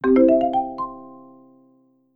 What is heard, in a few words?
marimba wake